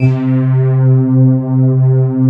PADS; Pro-Rec - Synthsonic; TR PADS

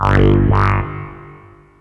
Sweep Bass, Drum & Bass; Fluid Dynamics

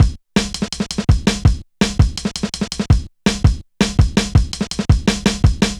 Persistent, Jungle, Drum Loops 165.5; Zero-G Jungle Warfare 1, 2 & 3

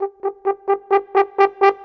Horn, Cinematic; Sonic Foundry (Sony Creative Software); Orchestral Series VOL-3; Cinematic 21

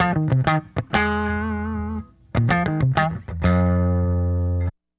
Guitar, 70s Analog Funk; Sonic Foundry (Sony Creative Software); What it Is!

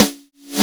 SN, DRUMS; Analogon

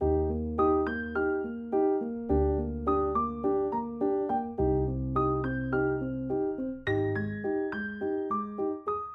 D#maj 105BPM Piano, Music Loops; 99Sounds; Monosounds; PANDORA BOX!; Miami Beach

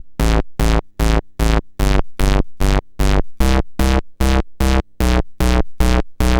C64 Donk Bass, Commodore 64, Chiptune; 8-Bit

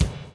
DOWN, DOWN KIT 78; AMG Gota Yashiki Groove Activator

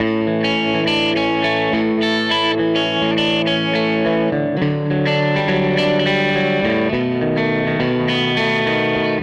Gretsch Guitar Loop

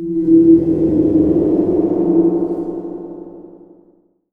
SUPER BAL, GONGS; Voice Crystal - Steve Reid Definitive Percussion

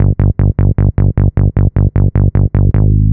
Boosta Bass; Basses; Dr. Fink's Funk Factory

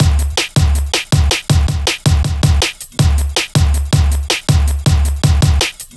Swing, drum loop, jungle, 160.5; Zero-G Jungle Warfare 1, 2 & 3

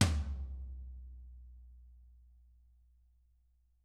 DW hit, Drums; DW+CAMBRUSH; ILIO; Double Platinum Drums 1

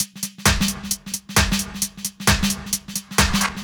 132 No Bd, Drum Loops 132bpm; Zero-G; Soundclash Nu Dance Electro; Acidized Wavs; Plastic Man

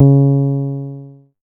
C#, PIANO; Big Fish Audio; Synclav FM

